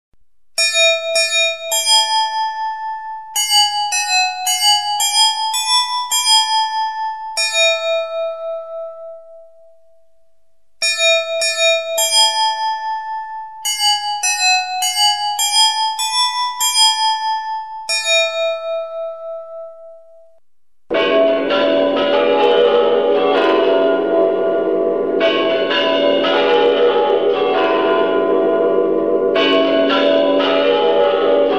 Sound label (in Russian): boj kurantov novogodnie kuranti s petuhom